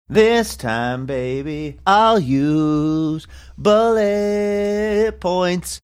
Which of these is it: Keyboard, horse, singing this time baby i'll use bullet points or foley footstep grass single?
singing this time baby i'll use bullet points